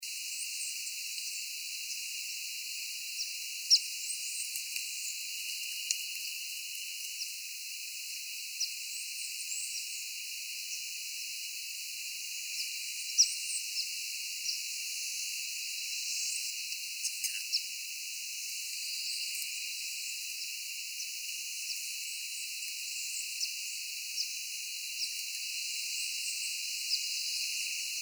white thighed swallow

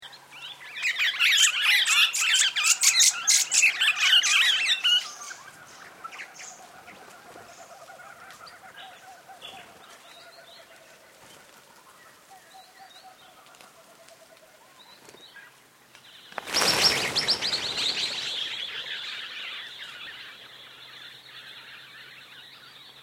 swift parrot

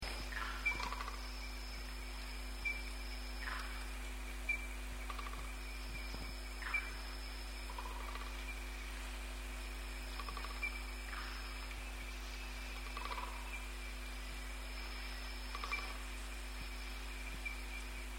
fire bellied woodpecker

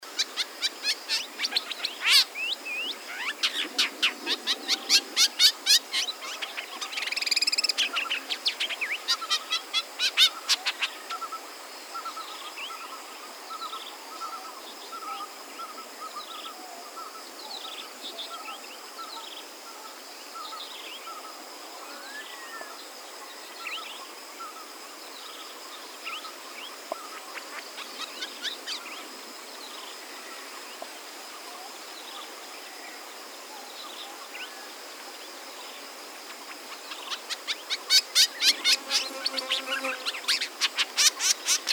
ashy woodswallow

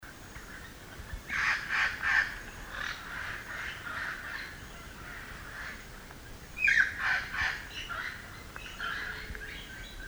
red crowned parrot

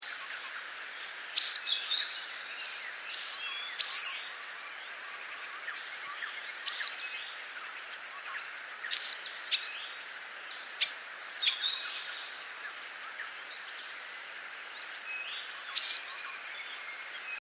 pacific swallow

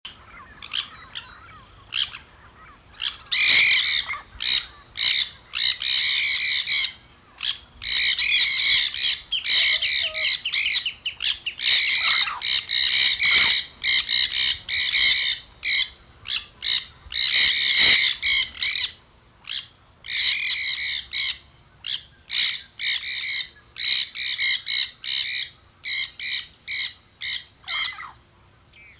nanday parakeet

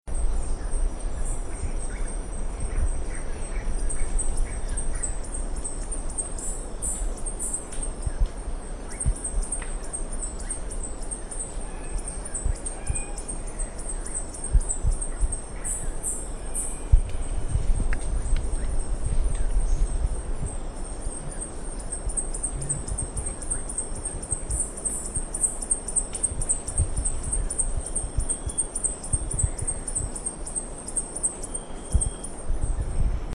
violet headed hummingbird

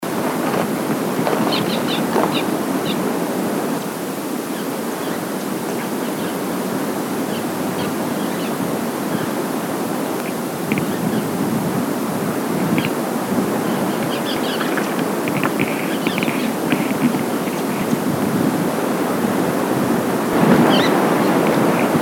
raimondis yellow finch

